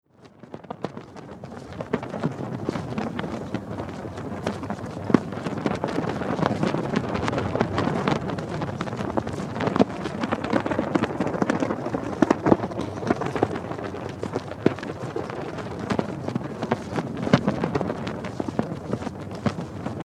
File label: Flag flapping